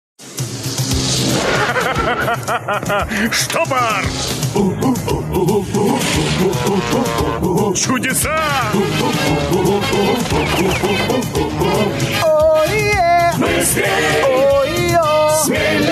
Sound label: Theme Song; TaleSpin